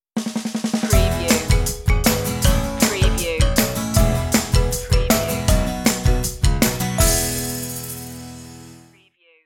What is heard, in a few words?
toddling toys stinger